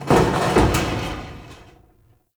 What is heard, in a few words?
Large Vehicle Creak; Ambience; Props; Warframe; Nineteen Ninety Nine